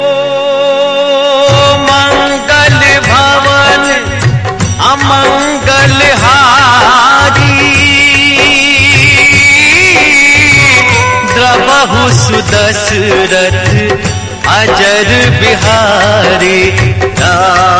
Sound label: Bhakti Ringtone